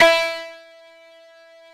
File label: glee Club, strings; Rockers